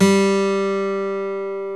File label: PICK MART